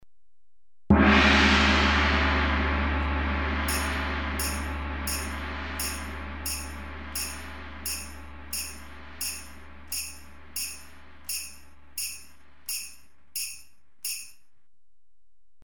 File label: GONG